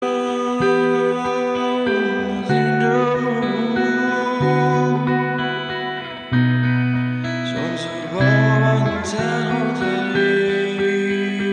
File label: Acoustic Version